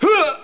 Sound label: grunt; Atari HQ Archive #1; Demo Man